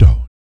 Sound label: PERC, Vocal, Jazz FX, BASS PERC